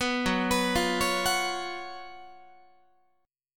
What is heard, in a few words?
Gb Major 7th Suspended 4th Gb Guitar